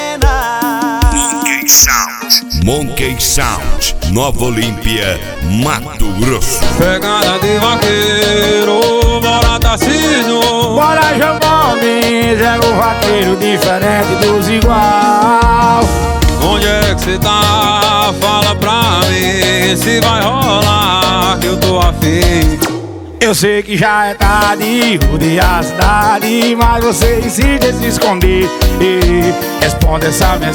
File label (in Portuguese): esp balada